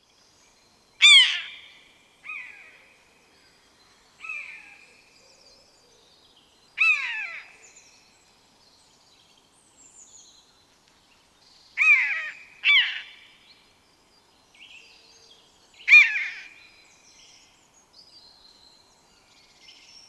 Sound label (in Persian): صدای سارگپه معمولی